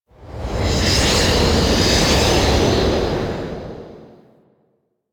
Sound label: Grapple Flight Whoosh; Enemies; Weapons; DE; Warframe; Teshin